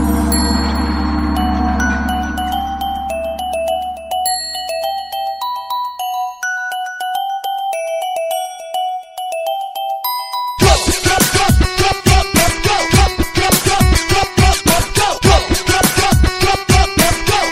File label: iphone xr dj ringtone